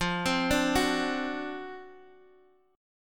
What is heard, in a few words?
D 7th F, guitar; D7/F arpeggiated; JGuitar